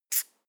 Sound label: marker line short soft